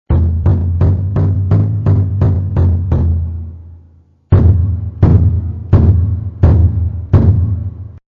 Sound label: Taiko; Zen Tones